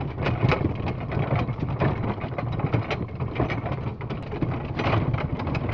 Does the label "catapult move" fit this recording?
Yes